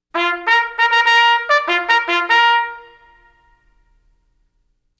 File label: Regimental Call